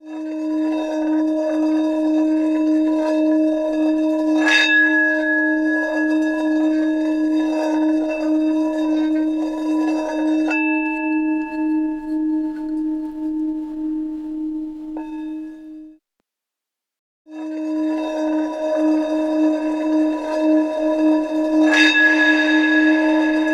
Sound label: klangschale sports hall